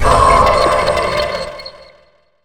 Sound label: Hide effect